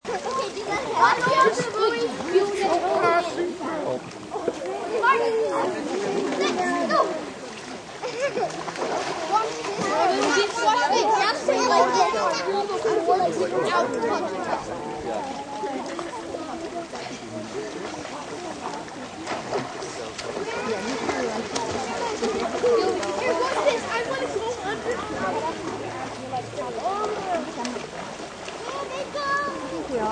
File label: Kids Family Lake Ambience, People at lake ambience; Lake Sound Effects; Lakes (Sound Effects:Nature)